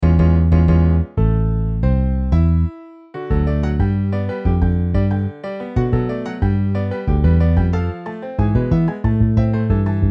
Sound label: Piano Bass MIDI